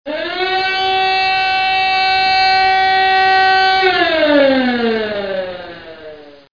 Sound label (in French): alarme, alarm